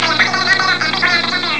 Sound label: funk; Amiga Soundtracker Sample Packs